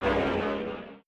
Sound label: Dark Monster voice hit